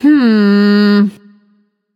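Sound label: thinking, female; smite; voicepack; hera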